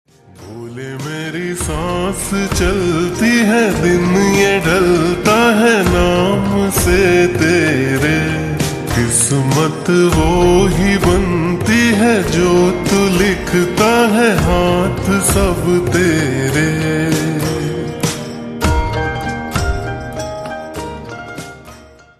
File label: Slowed Reverb Ringtone